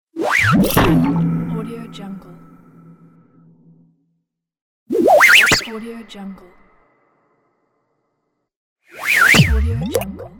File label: cartoon crazy swish boom